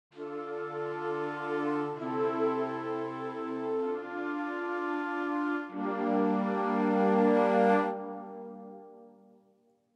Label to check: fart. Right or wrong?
Wrong